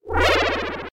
death

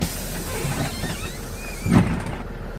newer door

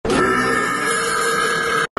burp

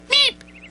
meep

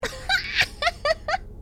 screech laugh

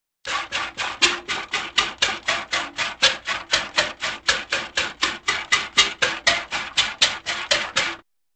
musical cow milking

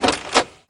camera sound; fnac

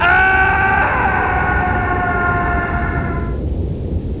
fall scream; mk mythologies

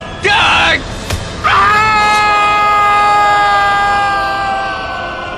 aaahh; god of war

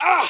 hurt sound; lego star wars; jango fett

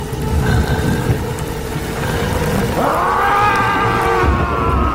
aaah; davy jones

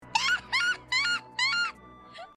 seagull; korone

